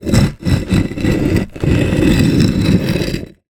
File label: foley large rock movement; zapsplat